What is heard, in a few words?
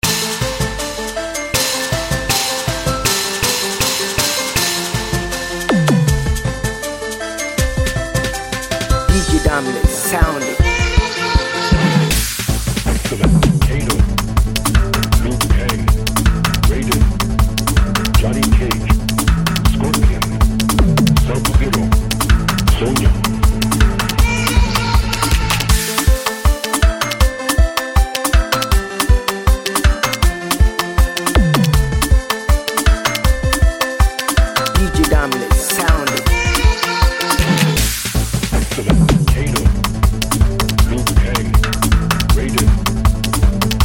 Immortals Mara Beat